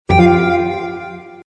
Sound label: sync end, Samsung SGH-i600 system